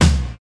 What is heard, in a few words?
00s Puffy Steel Snare Drum D Key; snare drum samples